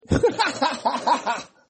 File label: laugh